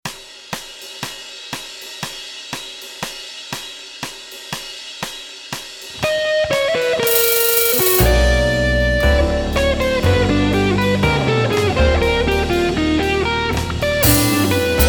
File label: improvising mix, guitar